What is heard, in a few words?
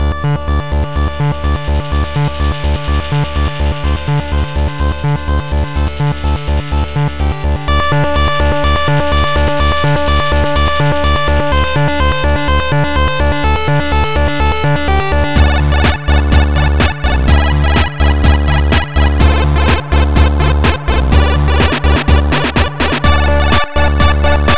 KEYGEN MUSIC RINGTONE; AD POPUP KILLER